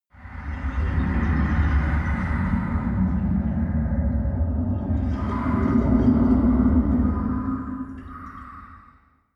drone water ripple; musikelemente; drones

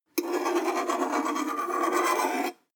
table scrape flutter; industrie wirtschaft; metall

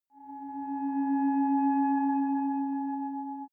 light beam, Energie

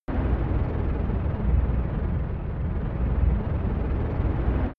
spaceship engine light flap; raumschiff

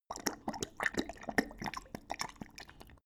dog drink quick; natur-tiere; hunde

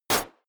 snap

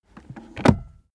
car accessories armrest close soft

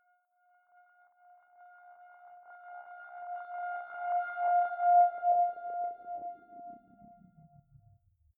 static string high; risers

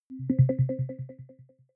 button echo beep resonance arpeggio; maschinen; signale

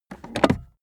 car accessories armrest close hard

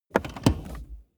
car bmw e46 interior seat adjustment lever

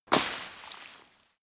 metal impact wire; hits explosionen; metall

